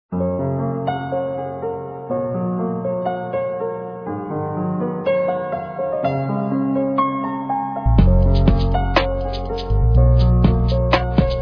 music

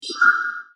bump roll, rollercoaster

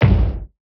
thud; battle; cross-code

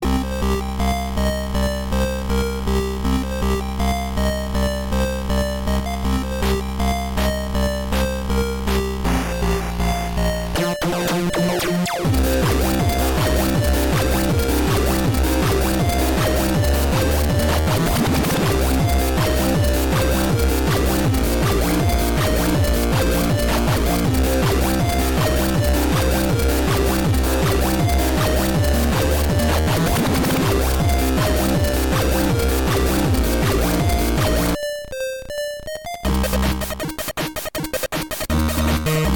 Sea Buoy, music

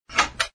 latch

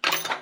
metal clink; 2fast2curious; fieldgoal

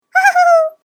happy; Flashpoint; tobiidynavox; catchme

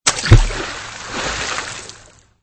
splash

